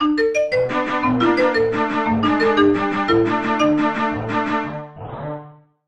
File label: Dance; terrorbane